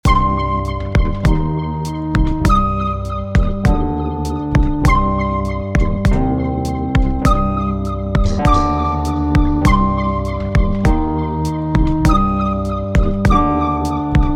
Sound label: Pipe Jam; Chhaya